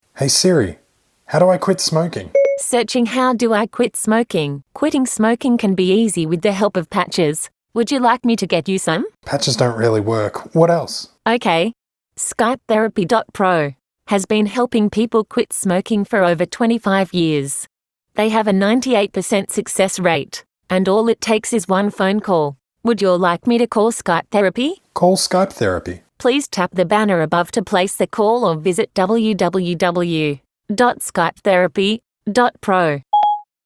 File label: beep